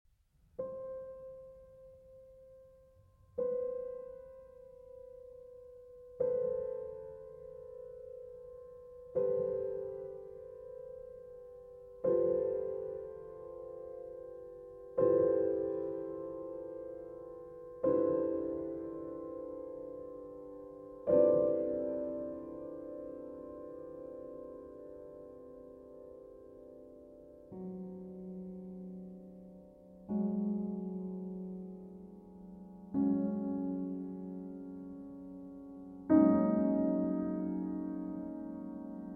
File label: Little Chorale, Grand Piano